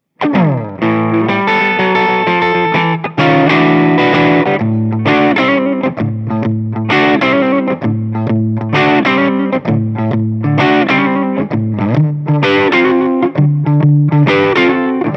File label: overdrive